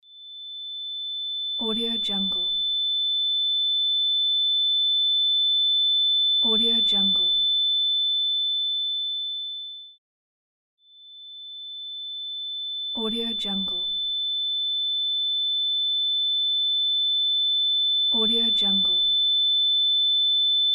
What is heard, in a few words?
ear ringing after explosion